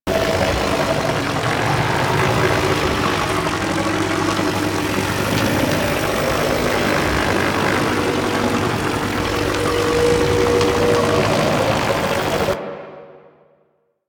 Amp Barrel Loop, Amplifiers; Warframe; Operators; Corp Amp Set One; Barrels

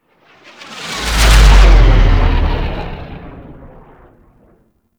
Moon Intro Rail Jack Fly By; The New War; Cinematics

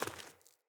Sandals Walk Rock, footsteps; Player

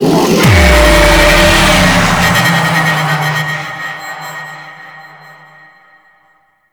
Vocal Wailing Song Cast; Enemies; Vocals; Warframe; Teralyst

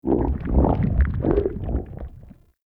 Stomach Grumble; Warframe; Grendel

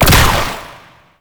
Ranged Weapon Fire; Warframe; Necramech; Weapons

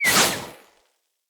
Bow Arrow By Charged; Weapons; Player; Bows; DendritBow

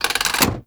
Rolling Drone Turret Turn; Warframe; Grineer

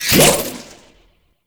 Crack Retract; Warframe; Warframes; Khora

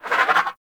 Bird Captured Idle; Hunting Critters; Sawgaw

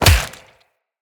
Melee Fist Impact Flesh

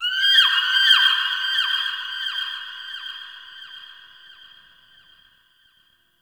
Forest Distant Misc Animal; Warframe; Grineer

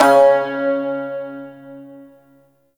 Shawzin Oct One C Sharp; Warframe

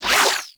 Beam Retract; Warframe; Corpus; Glaxion Beam Moa

